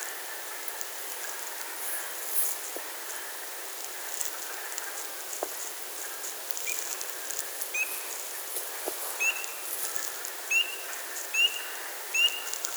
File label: pseudacris crucifer; iNaturalist